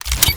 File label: atk charge vibe